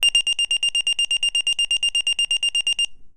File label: paging function